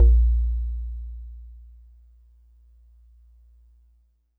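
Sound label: Warble Celeste DX100 A#0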